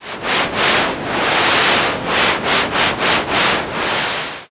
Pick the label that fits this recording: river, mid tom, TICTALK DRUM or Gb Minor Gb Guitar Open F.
river